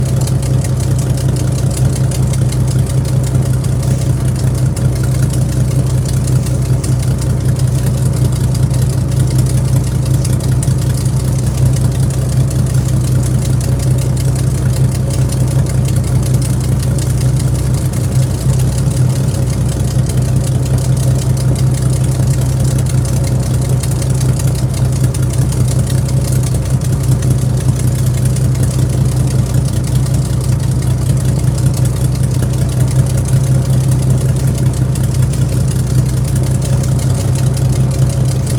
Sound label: engine low int, stuka; gmod; lvs planes